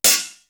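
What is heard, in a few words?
house hat, cymbal; East West; Global Sounds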